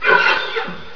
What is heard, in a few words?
farm